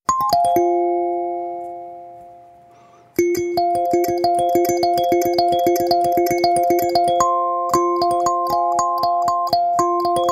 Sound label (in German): kalimba b5 f moll; hokema